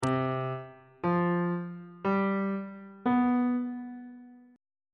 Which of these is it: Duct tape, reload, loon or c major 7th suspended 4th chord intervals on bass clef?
c major 7th suspended 4th chord intervals on bass clef